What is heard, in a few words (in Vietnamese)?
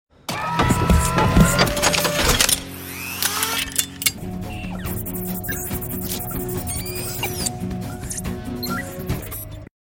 Am thanh bien hinh cua iron man